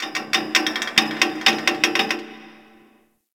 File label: Ocean Medium Metal Creaks; Ambience; Warframe; Grineer Ocean